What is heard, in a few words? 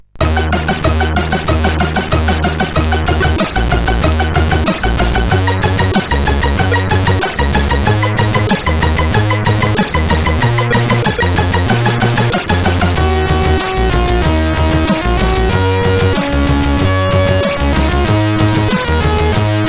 Music Box tune, CSG8580R5